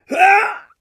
launch big pain; elivance

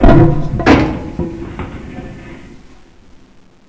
freight stop

